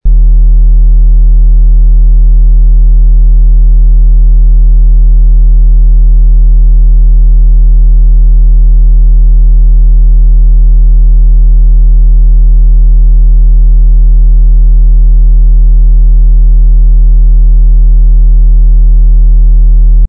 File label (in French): 40 Hz, Fréquence Fix de 40Hz; 40Hrz 20s; Design by DJFTS - Frédéric FUENTES